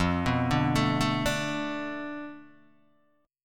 F 6th Flat 5th F down Guitar DADGAD, chord

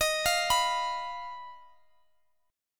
Eb Suspended 2nd Sharp 5th, Guitar, Open D

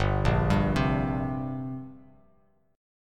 G Minor Double Flat 5th, G, Bass Standard; arpeggiated; JGuitar

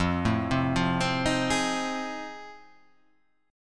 F 7th Sharp 9th

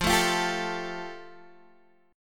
F Major Add 9th Guitar DADGAD; down; chord shape

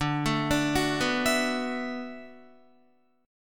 D 6th Add 9th, Guitar; D6add9 arpeggiated; 10,12,12,11,11,11; tuning 4E,4A,5D,5G,5C,6F

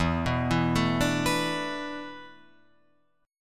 E 7th Suspended 4th E down Guitar Open F; E7sus4; arpeggiated

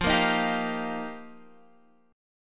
F 7th Suspended 2nd Suspended 4th, Guitar Open F; F7sus2sus4; strummed; x,12,10,10,10,12

